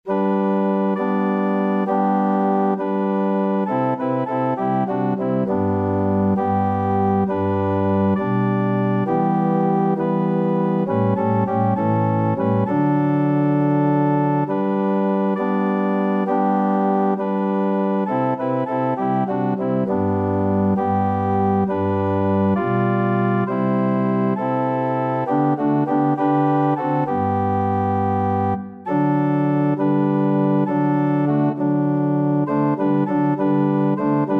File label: organ